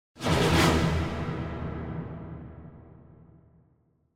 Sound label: Symphonic Hit Battle Finale - Long; Hollywood Edge; sound designer's toolkit v2